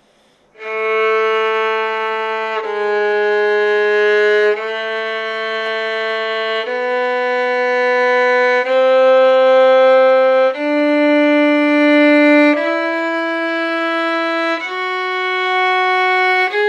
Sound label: scale arpegios a moll